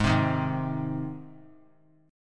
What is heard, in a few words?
G sharp Major G sharp, chord; G# strummed; JGuitar